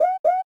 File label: Wup, notifications; Explay; Blaze